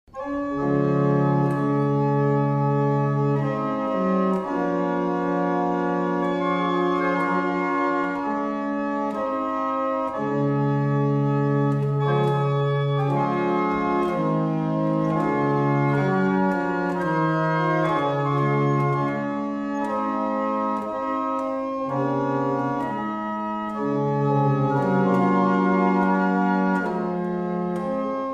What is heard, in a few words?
barrel organs; Tytherley; Manchester